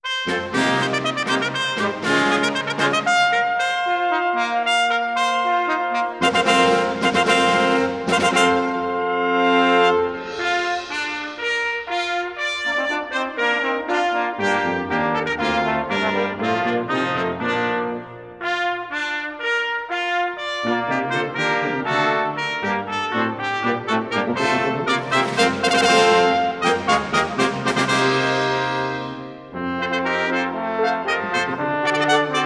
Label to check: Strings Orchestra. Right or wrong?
Right